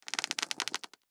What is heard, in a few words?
Bow Draw; Survival Game Sounds - Lite Edition; Combat and Hunting